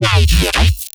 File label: bass hit neurosis; Virtual Riot